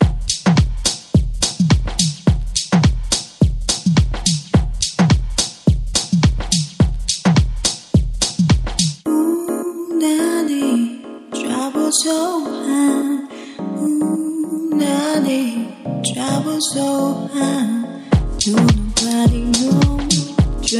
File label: Dancefloor Groove